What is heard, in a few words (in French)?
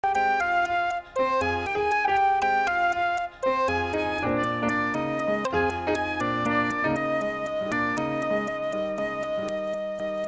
Chantes Enfants musique